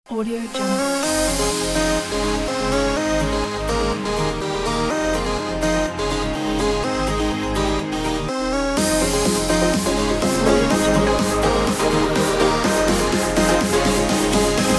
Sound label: dance party